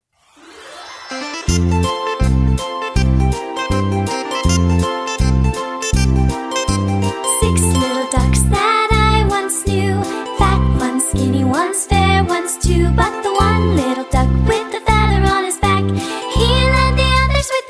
Six Little Ducks Childrens music and songs for kids